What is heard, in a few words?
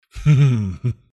Chuckle; Father Gascoigne